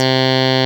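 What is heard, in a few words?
synth reso clav; AMG - Now CD-ROM (Roland); G.T.M. Pads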